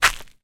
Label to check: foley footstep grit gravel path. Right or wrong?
Right